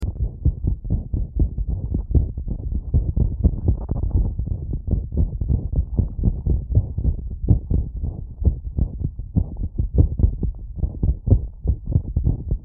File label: ATRIAL FIBIRLLATION, Heartsound; Heartsound Library